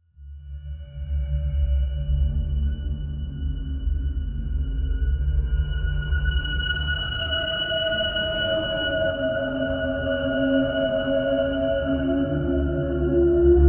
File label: mysterious